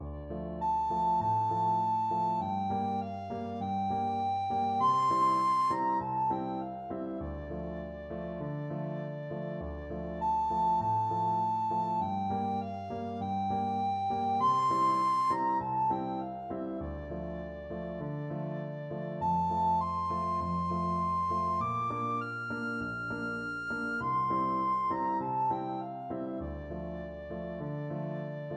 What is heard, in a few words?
horses recorder